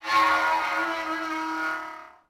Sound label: Gate Squeak; Cars; XBOX